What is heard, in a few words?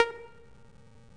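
menu click; stardunk ios gamerip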